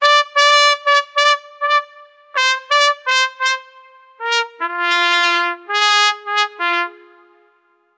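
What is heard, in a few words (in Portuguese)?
trombetas soando estilo; brass instrument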